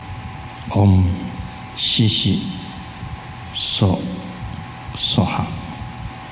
Mahasthamaprapta Mantra

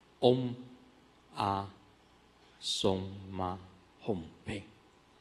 Ekajati Mantra

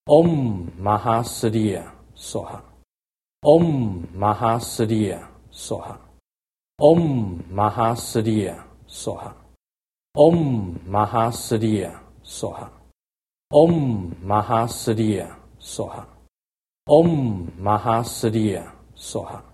mahasri mantra

